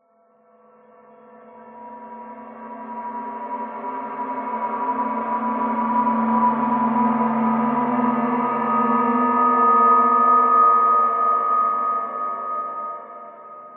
swell transition slow cold metallic left right resonant; sound design accent; dissonant; Skyclad Sound